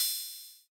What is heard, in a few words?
Yamaha DJX 2B, Rh Box Cymbal